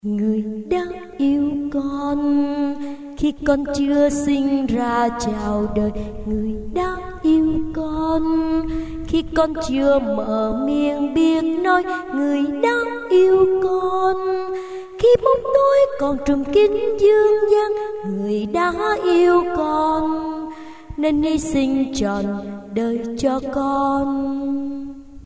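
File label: soprano tenor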